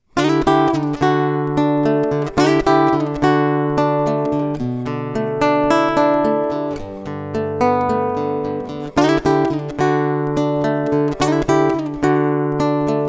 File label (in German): Pop Ballade Piezo